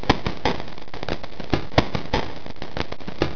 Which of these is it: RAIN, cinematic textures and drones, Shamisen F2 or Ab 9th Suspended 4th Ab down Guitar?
RAIN